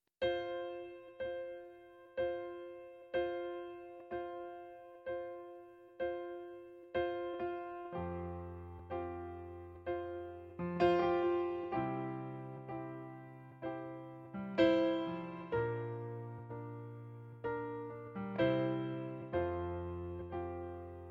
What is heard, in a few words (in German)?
Pianoplayback Sängerin F-dur